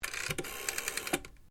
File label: PHONE CAPTAINS OFFICE DIAL, Sounds onboard HMAS Castlemaine; Boats; Vehicles